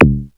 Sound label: SQUONK; ELECTRIC-SET